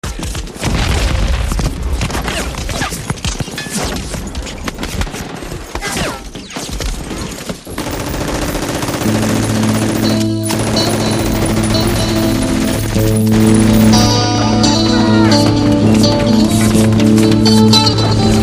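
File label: acoustic guitarist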